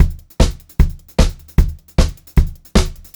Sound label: 152 SPEED HH, Pop-Rock Drumloops; USB Soundscan vol.37; AKAI; 90 s Sample CDs